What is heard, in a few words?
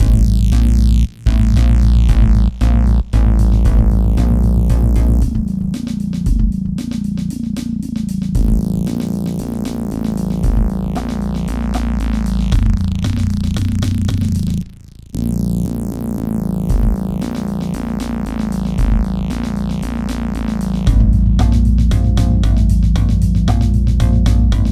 slow pop